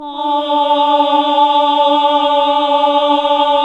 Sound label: HAL AAH, Nuns Aahhh